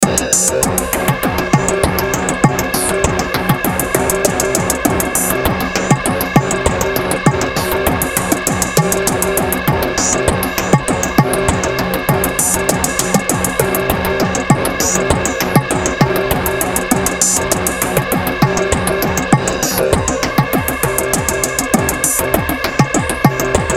BEAT ELECTRONI, Beat Electronic Ghostly Distortion; Miscellaneous Loops; Blastwave